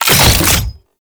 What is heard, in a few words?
Fuel Projectile Pick Up; Warframe; Jade Shadows; Ascension